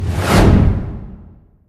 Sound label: whoosh; bobby